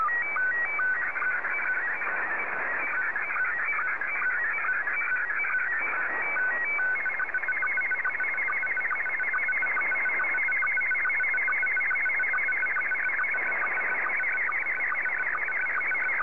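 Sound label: SSTV, Scottie 2; JA3QJQ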